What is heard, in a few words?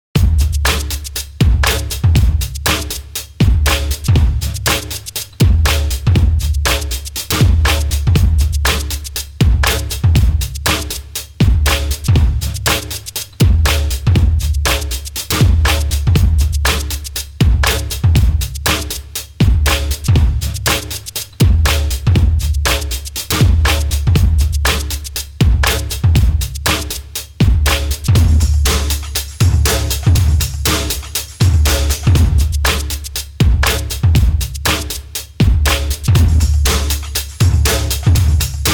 drums